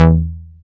Dance Bass; Sound & Vision - Gigapack II CD 1

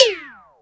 FX Sounds, Drum Machines

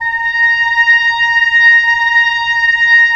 WND OB P, Oboe p; Roland - LA Composer vol. 4

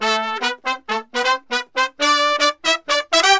120 AM L, BRASS SAMBA; Best Service ProSamples vol.14; AKAI

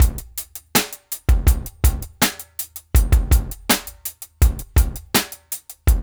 DRUMS, Funky Ass Loops

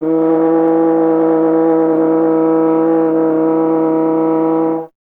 F. Horn; Miroslav Vitous; Solo Instruments VOL-2

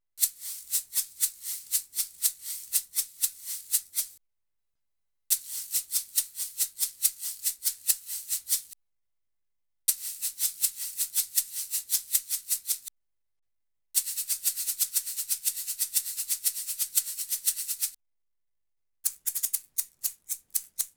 Salsa Picante; Masterbits; World Zone VOL-3

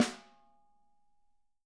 WOOD, Living Drums; Sampleheads; Peter Erskine Living Drums

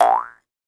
Jaw Harps

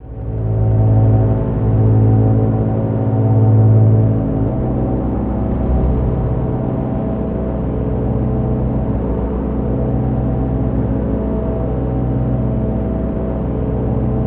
DRONE; ENVIRN-AMB

